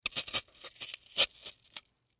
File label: Foam Insert Rod Flower Decoration Art Craft; Miscellaneous Office; Foley; Blastwave